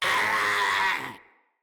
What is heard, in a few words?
Infested Runner Attack Call